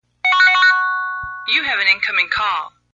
Incoming Call, ringer; V710 Ringer

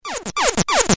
Notifier; Communique; V710 Ringer

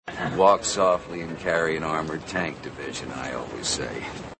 Armored Tank, Ringer; V710 Ringer

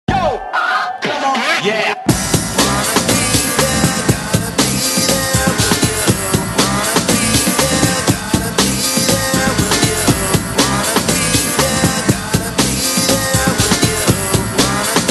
digital, ringer; V710 Ringer

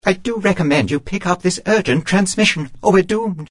Urgent Message, ringer; V710 Ringer

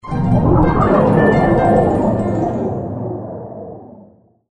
Notifier; Reflection; V710 Ringer

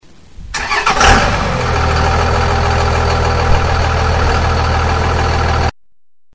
Cummins Diesel; V710 Ringer

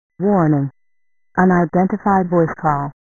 Warning; V710 Ringer

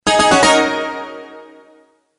Notifier, Ringer; Honor; V710 Ringer

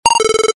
Ringer Office Pro; V710 Ringer

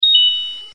Alarm; V710 Ringer

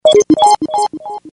Notifier Satisfied; V710 Ringer

